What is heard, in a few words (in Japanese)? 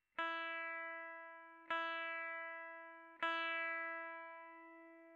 1弦の「E」